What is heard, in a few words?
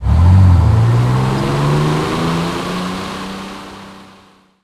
valentine engine load, tanks; cannons; War Thunder